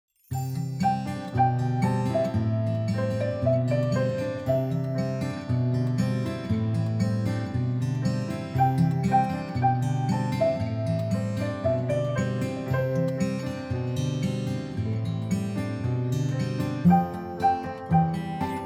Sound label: GTR